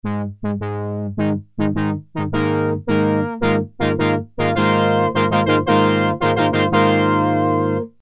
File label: Brass in Octaves, Korg Poly-61M